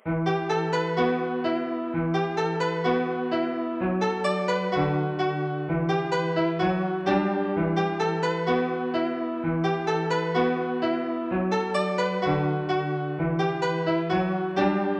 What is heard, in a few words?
Melody Loop Emin 128bpm